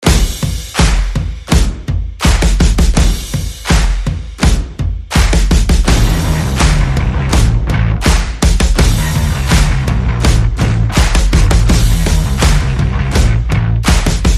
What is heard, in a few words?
Percussion Bass and Effects